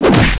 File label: fist hit wall